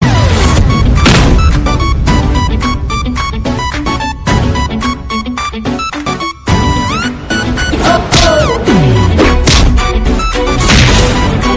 instrumental bgm; maddam sir

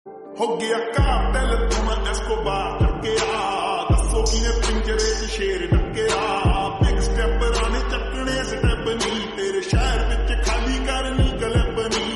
slowed reverb

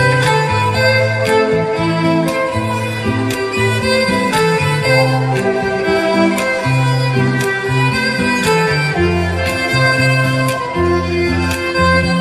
violin instrumental

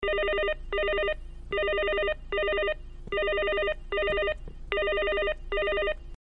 business ring; gio

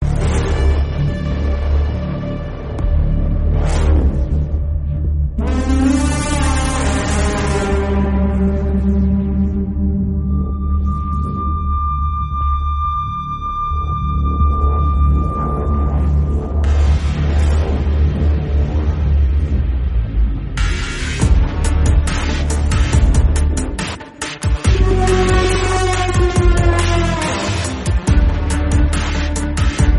theme; spider-man 2099; miguel o'hara

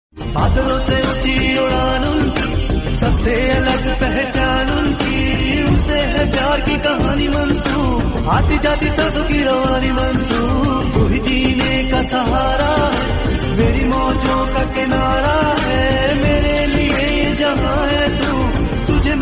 female version

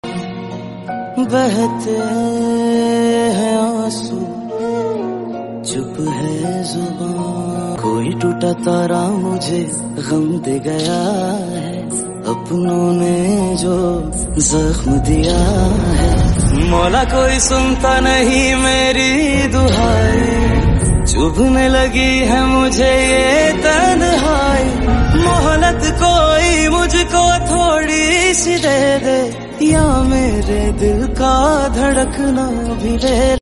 hindi sad

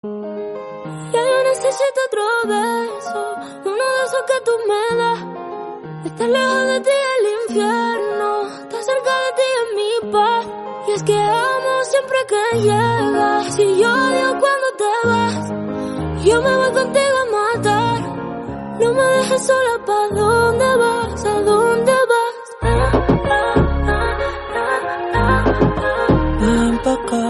english song

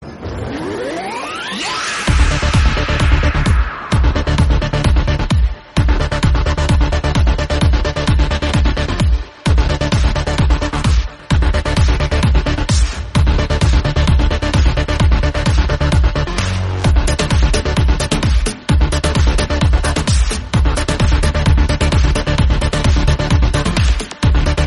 only music

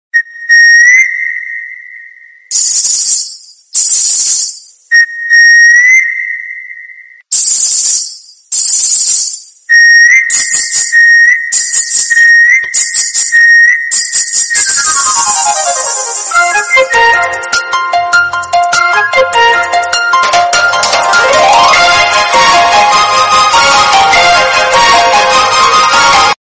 love bgm tamil; jayam

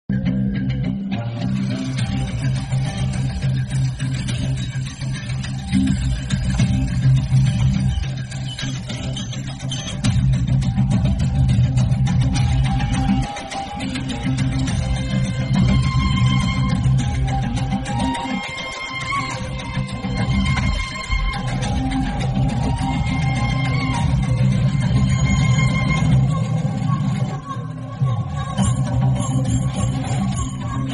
music bgm; jailar; varma

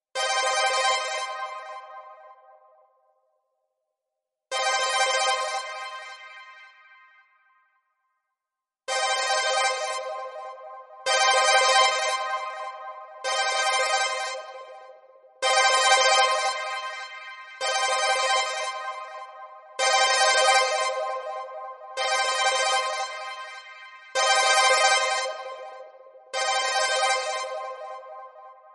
alien ringtone

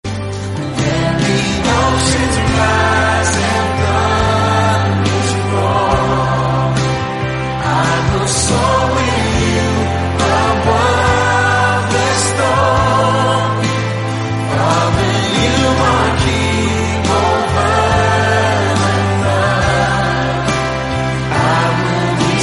devotional English song

